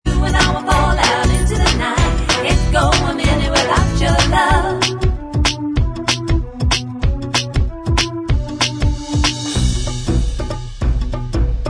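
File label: Bvox Track, backing vocals track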